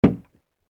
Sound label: Snowball Hits Window; Snow Sound Effects